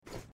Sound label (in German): zurueckstellen backpack sound; Life is Strange 2; gamesoundopedia